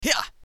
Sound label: Hyah; Assemblee SFX